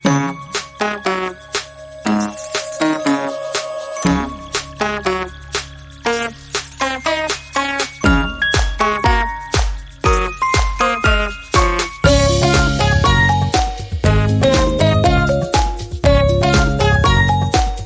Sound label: Kid Calypso